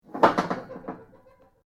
METAL GIRDIR MOVE; Materials

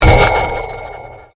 Convolution Pulse

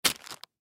Plastic Bag Drop Balloons Wrapper; Drops; Foley

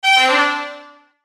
CHIME SWELL MELODY; Chimes (Sound Effects:Multimedia)

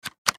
Switch Slide; Multimedia Web Interactive

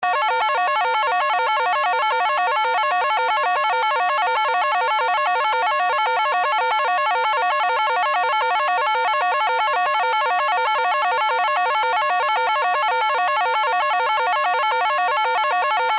Scifi, Console, Alarm, Loop; Science, Fiction; Computer Sound Effects

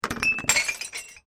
Bottle Smash Slightly; Smashes; Objects

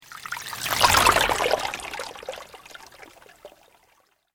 Sweep Organic Slow; Created sweeps, swooshes and other movement effects; Sweepers; Sound Design; SoundEffects-Foundation4

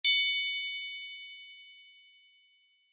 Accent Glockenspiel Chime; Bright; Mallets; Blastwave

